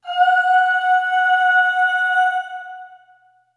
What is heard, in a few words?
Aah Short F#4 Short Reverb; Harmonic Building Blocks